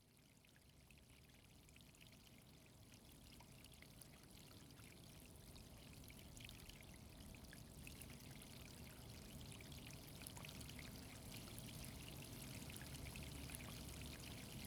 足湯環境音。タオルでごしごし, ASMR; 猫吠崎ホテルの足湯でぽかぽか

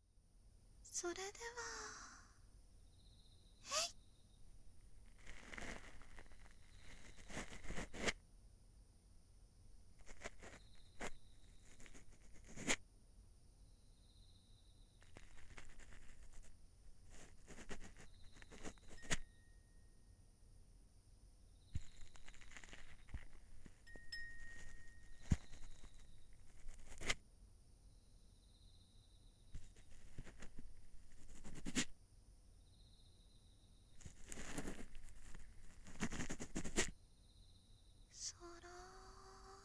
耳かき, ASMR; 左耳かき; お隣さん夏

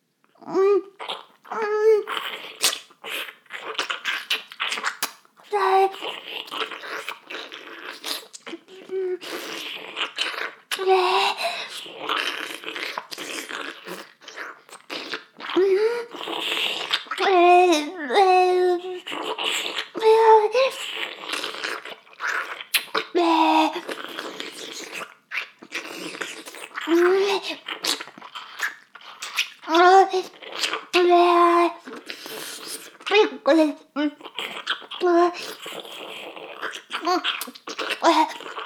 フェラ音声; 少佐殿; 牧場戦腺

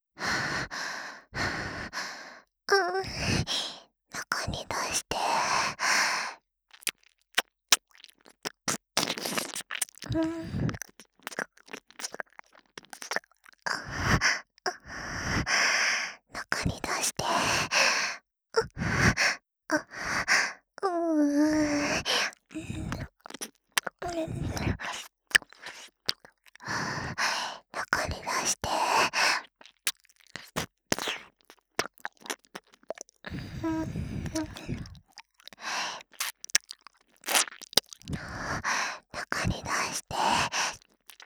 中に出して 耳舐め喘ぎ; ハーレムオナサポ 4人のメイドがご主人様のオナニーをお手伝いするバイノーラル～文、千曜、名月、莉桜～

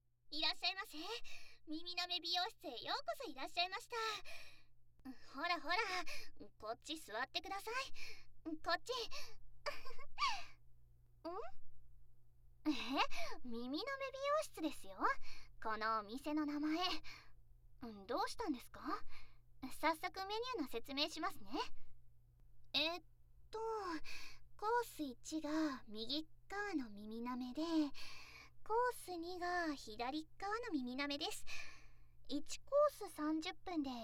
右耳奥舐めマッサージ, ASMR

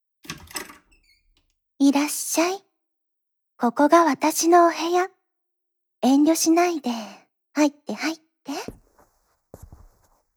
波打ち際; 美少女★癒し励まし集中ノイズ

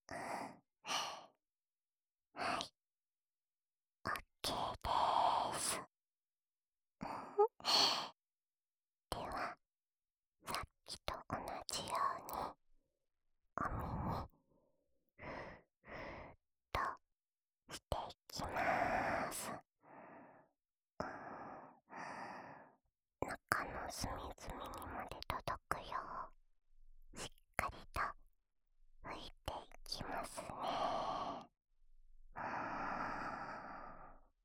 今度は反対側を……（左耳の耳かき）, ASMR

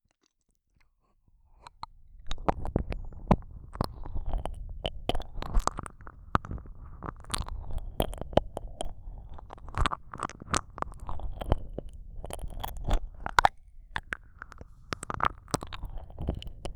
ねっとりじっくり耳舐め, ASMR

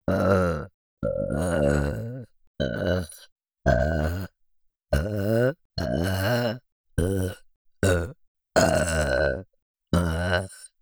甘えいちゃいちゃゲップ ゲップのみ; 甘えいちゃいちゃゲップで準備運動♪

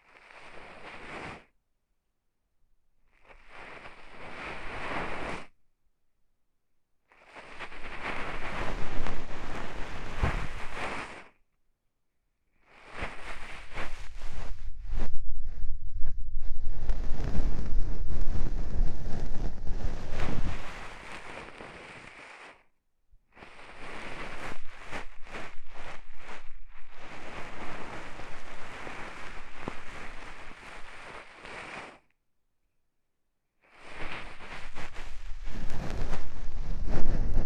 梵天 春の朝の環境音

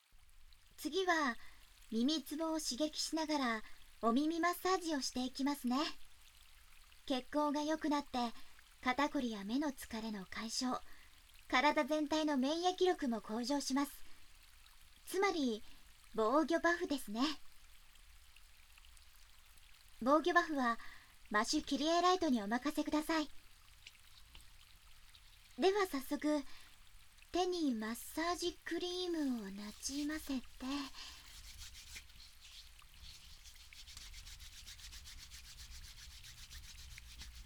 耳マッサージ, ASMR

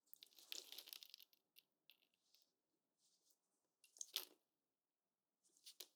潮吹き; アダルト系

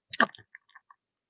引き抜き

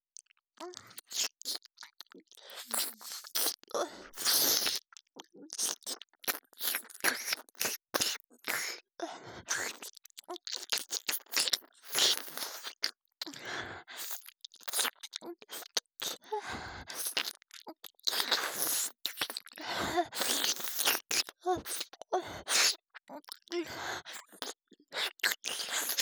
ぐっぽり耳穴に舌先を突っ込む

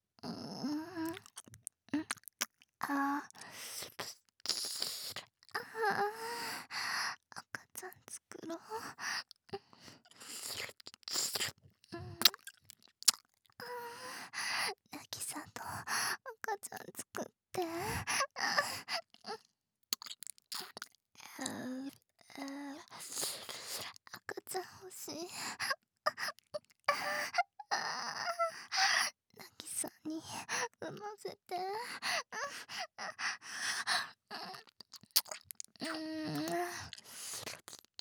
耳舐め喘ぎ; 赤ちゃん作ろ♪; DL製作班; ハーレムオナサポ 4人のメイドがご主人様のオナニーをお手伝いするバイノーラル

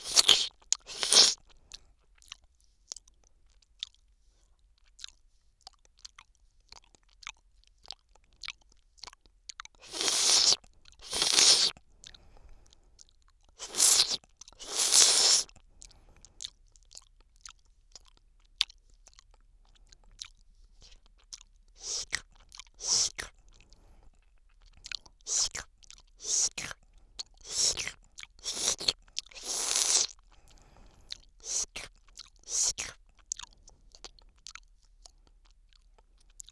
左耳 アイス舐め

